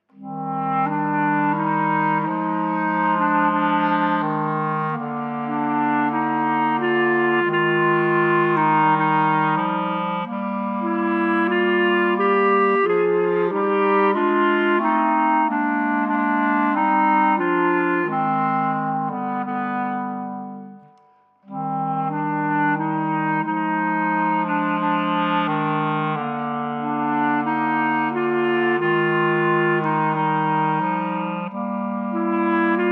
Chaconne Clarinet Trio

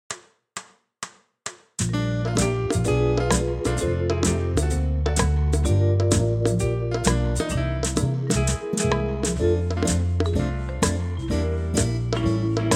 Backing Track

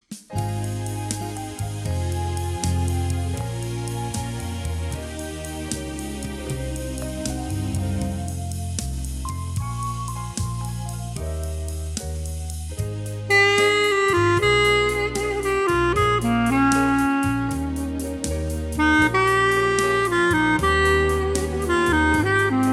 CLARINET

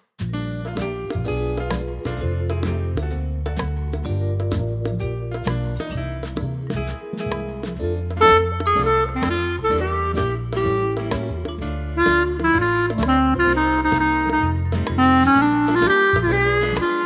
CLARINET

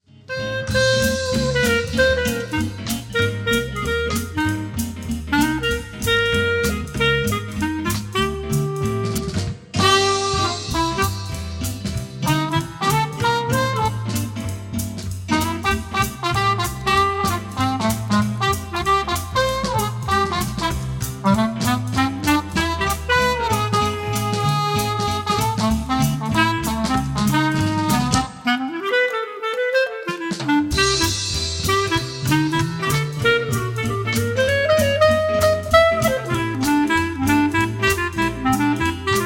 Clarinet; be bop for student chops clarinet

